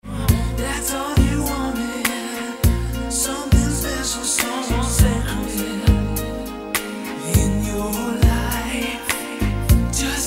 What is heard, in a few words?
roland d-50